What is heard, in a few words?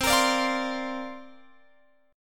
C 9th Suspended 4th C down Guitar Open F; C9sus4 strummed